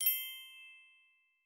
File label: notification